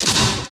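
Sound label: ZWOSH, metal impact; Total Control - Action Cutz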